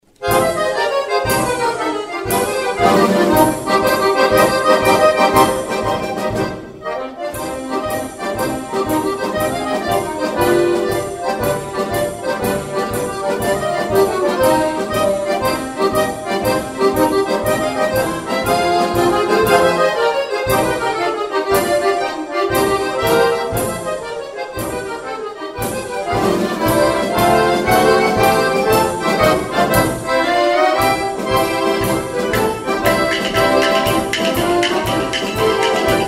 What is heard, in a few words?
Tarantella